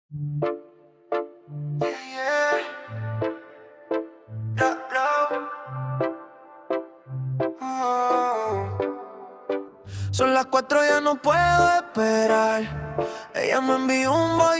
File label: Latin Urban